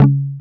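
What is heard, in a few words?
GUI C#, acoustic guitar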